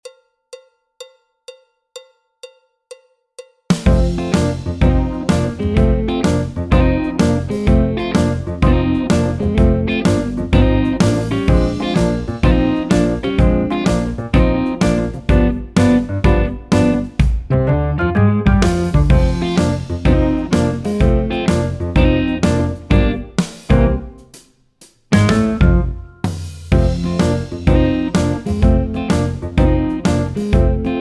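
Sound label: backing track, Jazz; alto, trumpet, trombone, piano; Jazz Syllabus Level 2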